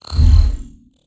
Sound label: inspect energy, weapons; cstrike; valorant; singurality phantom